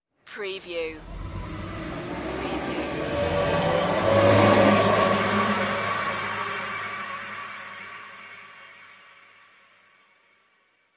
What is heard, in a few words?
hollow space flyby